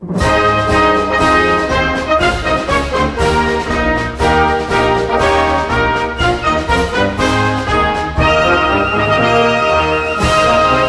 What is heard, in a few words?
ringtone; 阪神 タイガース